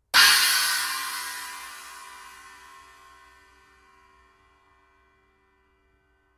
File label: ZBT 16in China